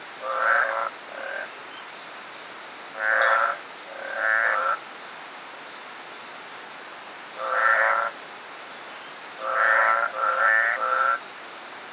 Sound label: Hylidae Smilisca phaeota